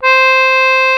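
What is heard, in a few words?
CONCERTINA; E-MU Formula 4000 Series Vol. 4; Earth Tones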